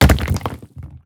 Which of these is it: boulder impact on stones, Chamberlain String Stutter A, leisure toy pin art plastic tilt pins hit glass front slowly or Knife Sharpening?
boulder impact on stones